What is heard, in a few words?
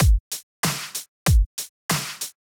groove drum